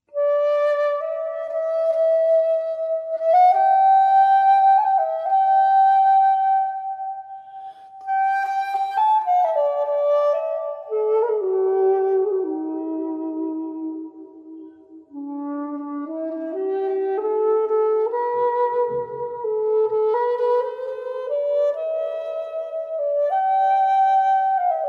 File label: Flute